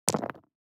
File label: DICE on Neoprene, Throw and Roll, Standard, 5 Five Dice; Samples; ArticulatedSounds; DICE Sfx Party (Extended)